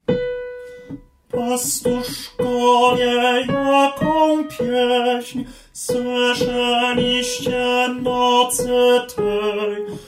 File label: TENOR; Nad Betlejem